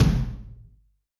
Rock, Tom, Yamaha DTXpress-II

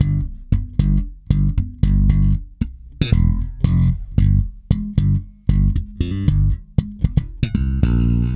THUMB G, Bass; Spectrasonics - Bass Legends CD 2

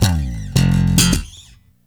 BASS SLAP; Stereo Guitars; Hip Hop; E-MU Formula 4000 Series Vol. 1; Hip Hop Nation

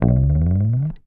HOFNER, Bass

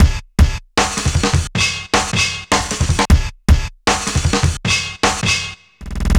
COLLIDER, breakcore 155BPM

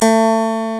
CLAVECIN, HARPSICH

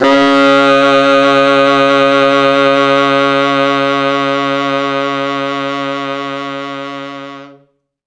T Sax C#2

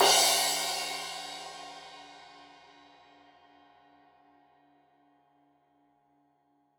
Crash 15in L edge, Cymbals Stereo

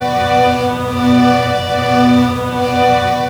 STRINGS, Pure Electro; USB Soundscan vol.03; AKAI

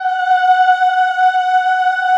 CHO F#5, Ooh; Ooh + Ooh 2

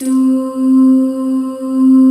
DOO PADS; Pro-Rec - Synthsonic

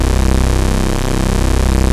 OSCAR C2, Synthesizer; Hollywood Edge - Giorgio Moroder Rare Synthesizer Collection

